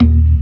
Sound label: ORGAN, C3 PERC; Keith Emerson Signature Series